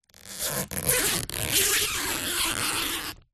foil birthday banner fingers rub long strokes squeak